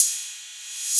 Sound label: jump